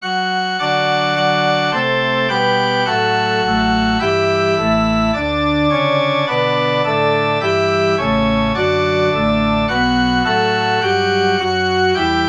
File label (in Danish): forspil